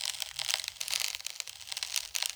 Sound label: celery break